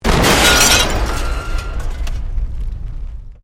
Explosion Medium Metal Glass

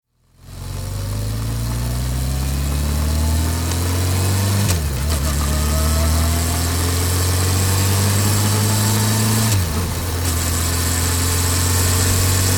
Motorbike Suzuki 650 On Board Travel; Various traveling then slows to stop - off; Motorcycle; Tom Hutchings

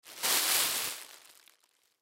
Bag Open Crumple Grocery; Rustling; Foley

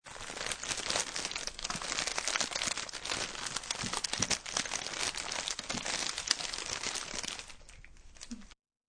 A plastic cracker bag being crinkled.; Crinkling Cracker Bag 1; Eating; Human

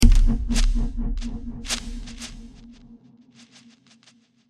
Drum Hit With Delays And Scratches; Burst Sound Effects; Bursts (Sound Effects:Impacts)

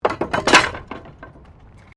Wood Metal Fall; Material components for constructing more complex sounds; Materials

